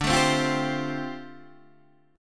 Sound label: D Minor 11th D down Guitar DADGAD